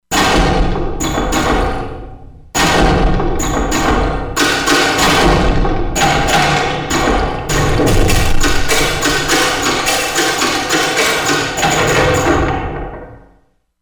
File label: SFX, Korg MSC-16S; Environment